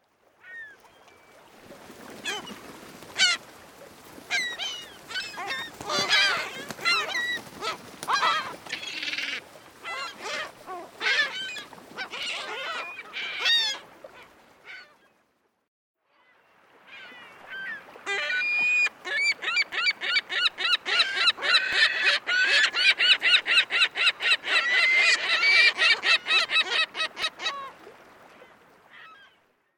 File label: California Gull